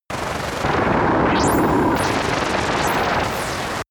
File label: facility damaged severe, sciene-fiction